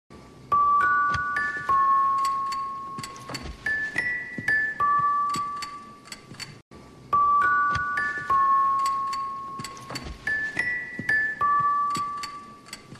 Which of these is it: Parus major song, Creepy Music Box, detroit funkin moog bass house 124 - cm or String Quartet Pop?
Creepy Music Box